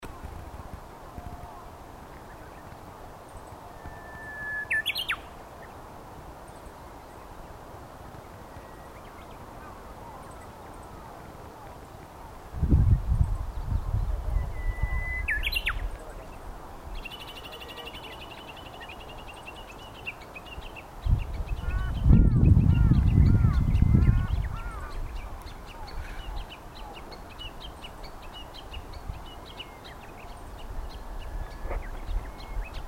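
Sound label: uguisu, Japanese bush warbler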